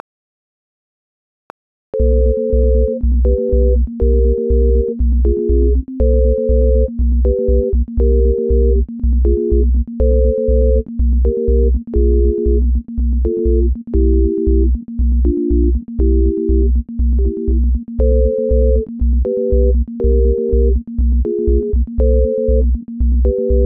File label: Sine assignment